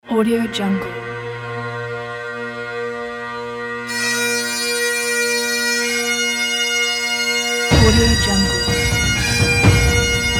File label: scottish highland bagpipe; huskystudio